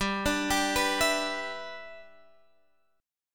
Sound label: G 6th, Guitar, Drop D